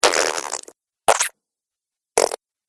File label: Slime Splats, Cartoon Sound Design Sound Effects; Pack 01